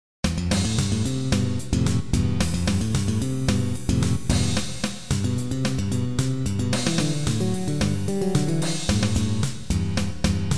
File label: Cool Drum